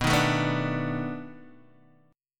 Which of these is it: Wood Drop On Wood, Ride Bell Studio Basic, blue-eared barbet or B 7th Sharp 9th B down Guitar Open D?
B 7th Sharp 9th B down Guitar Open D